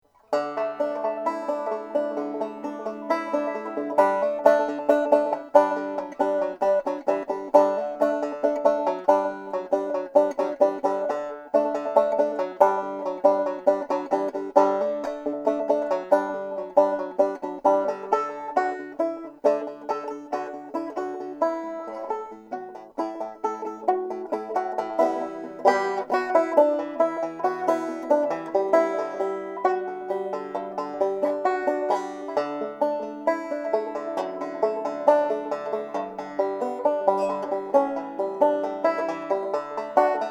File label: deering goodtime 6 string ba maple